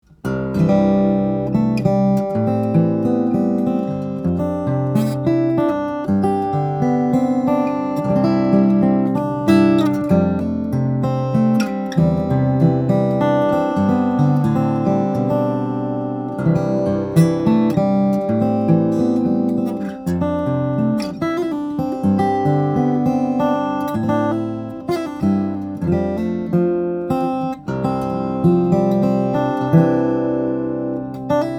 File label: OM Cutaway, guitars, steel-string guitars, flattop; macassar ebony german spruce; Kostal